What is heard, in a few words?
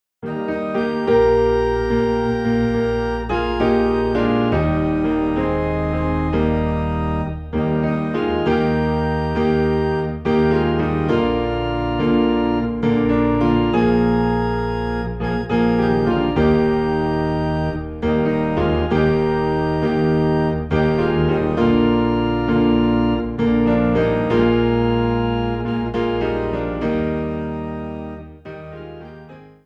piano and organ low in E flat; I Will Sing of My Redeemer